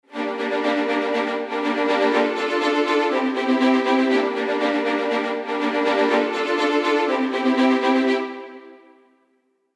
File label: Areia 16 Violins Measured Tremolo; Audio Imperia